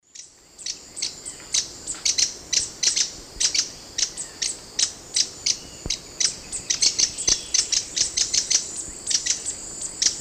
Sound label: Philydor erythrocercum, calls; S. America; Eastern Lowlands